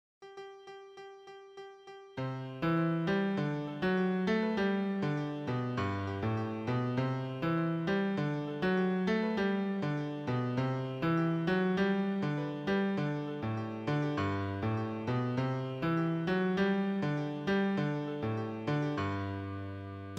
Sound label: PIANO